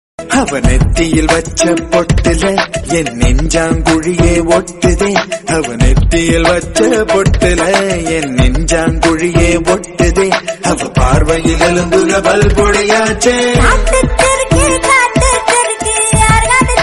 tamil ringtone

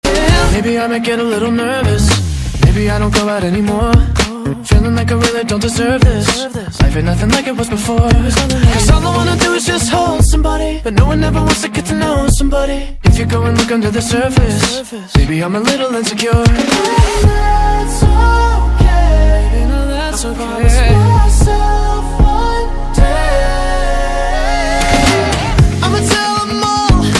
ringtone

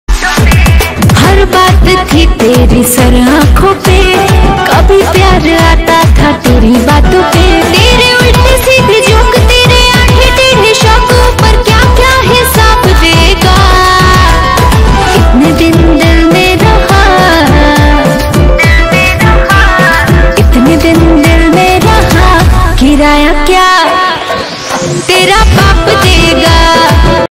bhojpuri ringtone

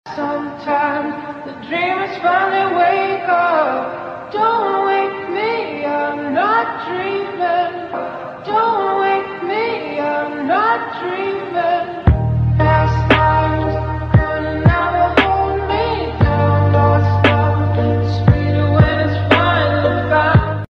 aesthetic english ringtone

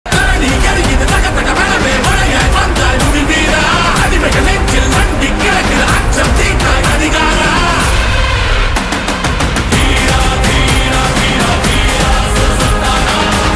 telugu ringtone

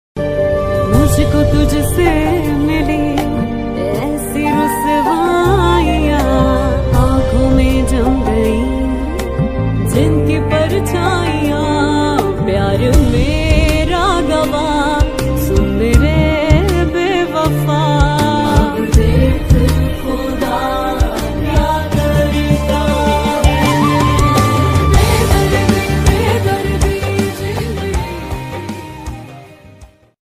Ost ringtone

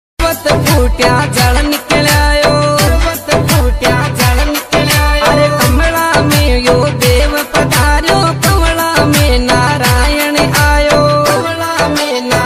devotional ringtone, hindi devotional ringtone

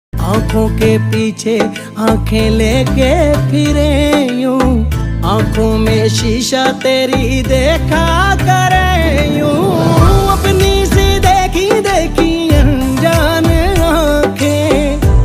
pakistani ringtone

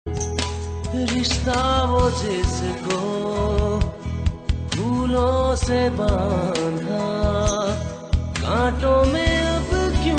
pakistani ringtone

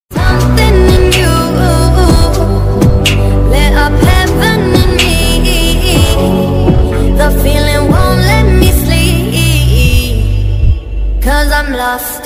english ringtone

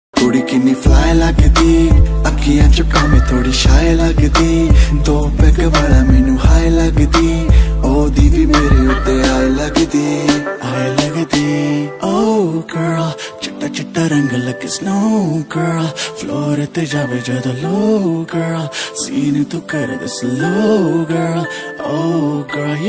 punjabi rap ringtone

